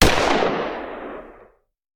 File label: Hand Gun; Sound Ideas; Guns